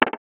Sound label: ui crafting menu closing